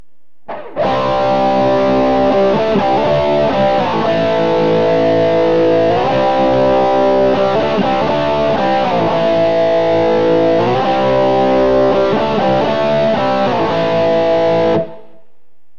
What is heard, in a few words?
bridge crunch; brobucker; Burny